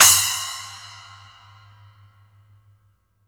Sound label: SPLASH C, Drumworks; Sampleheads; New York City Drumworks VOL-1